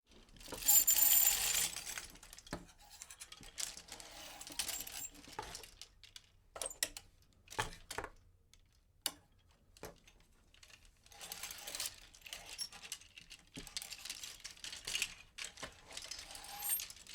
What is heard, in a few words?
sliding hangers